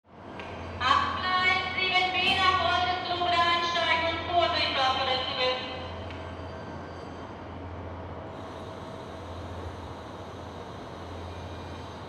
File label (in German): Bahnhof